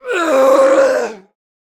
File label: Pike Death Vocals